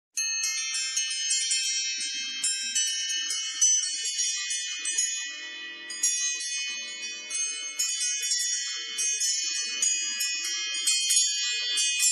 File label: Chimes; Chinese clones; iPhone IX clone